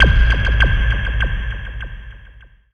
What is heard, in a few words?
FX HIT, Techno Trance; Ueberschall